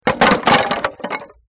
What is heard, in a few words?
wood fall